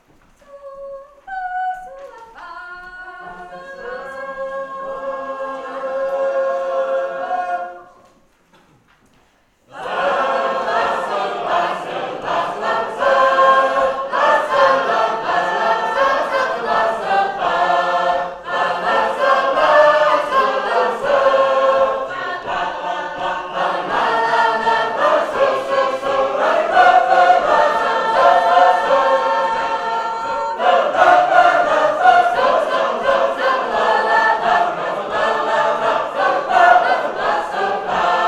Harmony

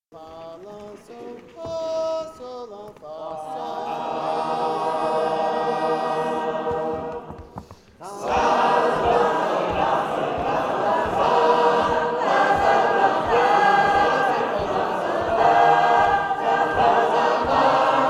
Harmony

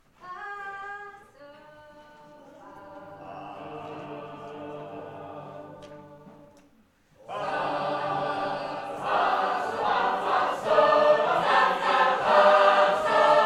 Gospel Trumpet

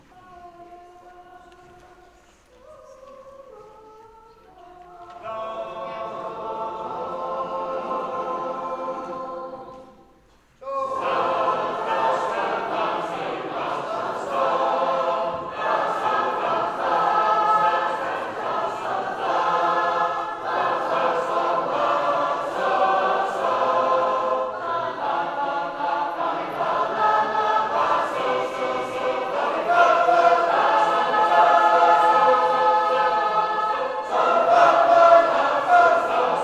Harmony

